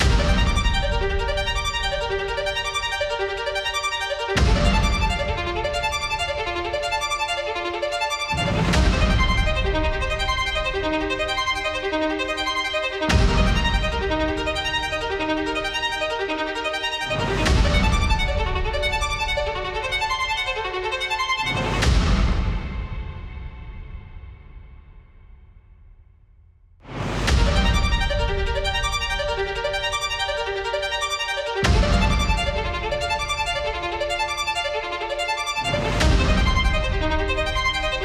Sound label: Strings Underscore; Anger Music Library